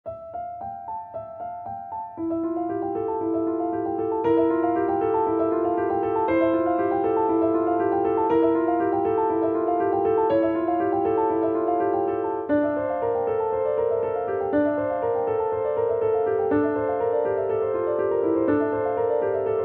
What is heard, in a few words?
piano; debussy